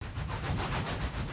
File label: warp